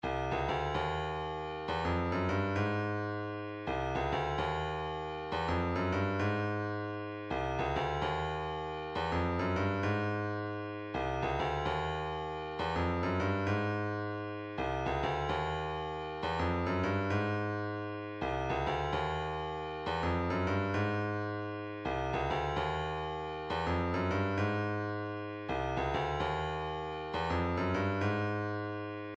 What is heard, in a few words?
vocal planet reggae, midi